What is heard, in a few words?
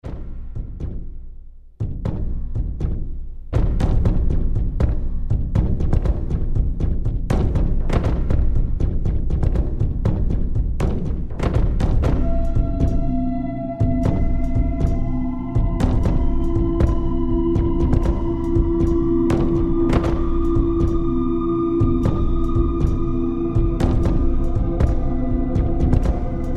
drums; Antigone